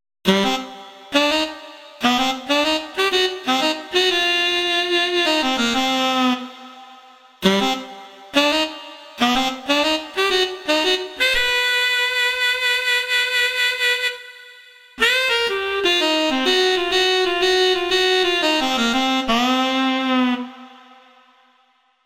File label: Tnr Sax, v-synth